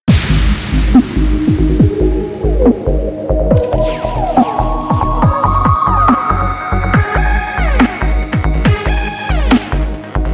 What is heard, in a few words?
Instrumental; lolicore; Comiket